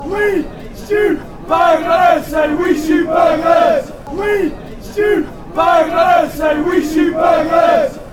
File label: we shoot burglars, fanchants